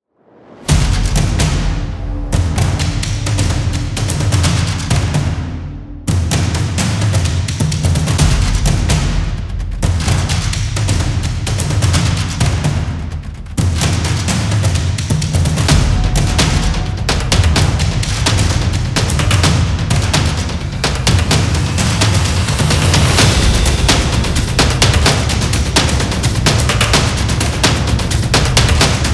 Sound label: Epic Drum; intense drum beats